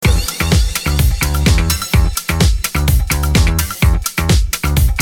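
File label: Rocking Music, ringtones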